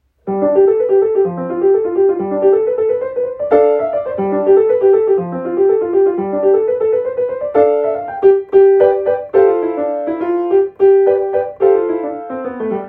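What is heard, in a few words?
Energized-fast, Piano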